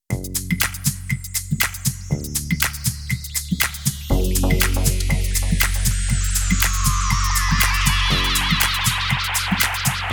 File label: Vocal Mix